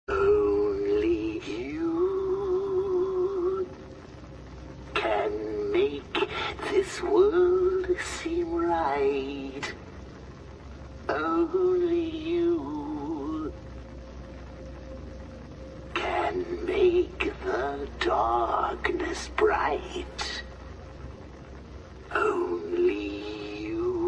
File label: singing; arkham city; joker; end credits